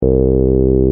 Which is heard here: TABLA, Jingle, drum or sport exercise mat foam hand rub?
drum